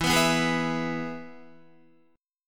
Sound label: E-Major E, Guitar; strummed; JGuitar